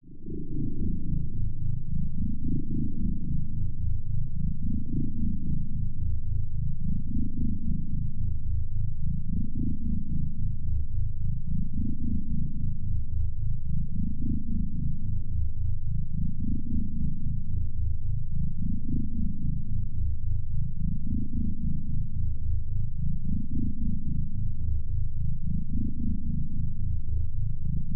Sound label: science fiction deep lfe force field; zapsplat